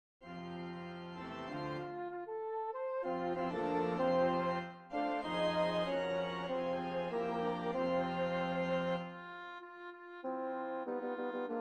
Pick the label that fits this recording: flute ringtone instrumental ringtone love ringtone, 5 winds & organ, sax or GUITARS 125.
5 winds & organ